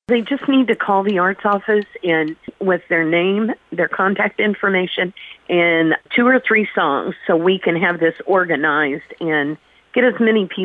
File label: Karaoke